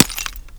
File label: brick impact bullet; tfa starwars melee weapons pack; nmrihimpact